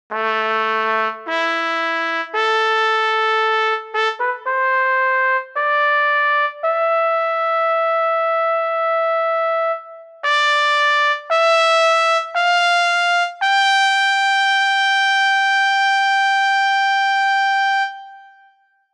TROMBA bez vibrata

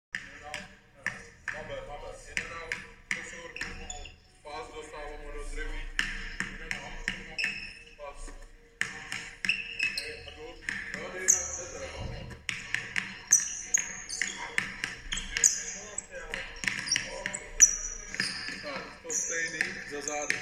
Situace

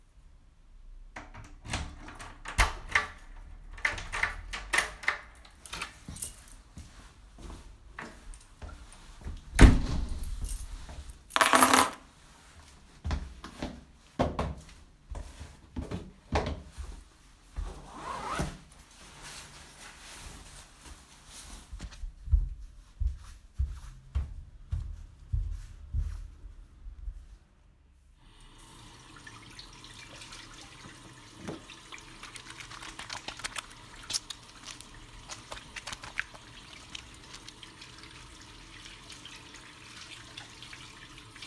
ruch

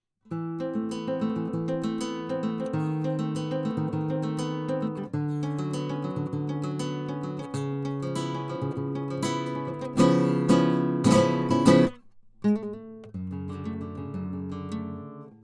Kytara kobylka

